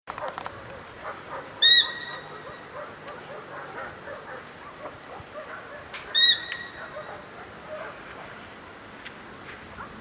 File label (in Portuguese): Som da Coruja diabo